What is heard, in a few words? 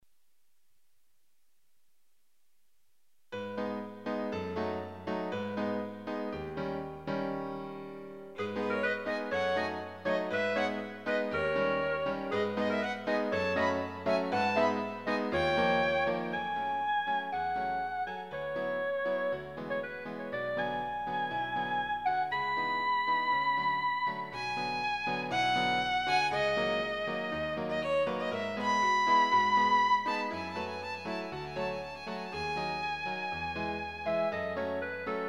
Trio; sound scapes